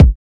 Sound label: old school subtle reverb steel kick drum sound A key